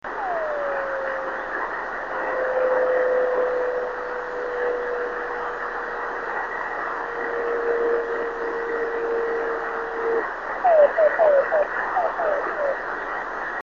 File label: CW 28326KHz, driftnet beacons